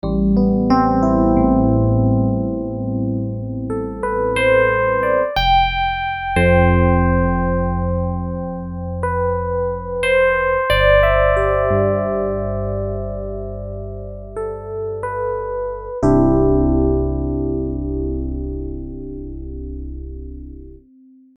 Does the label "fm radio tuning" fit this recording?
No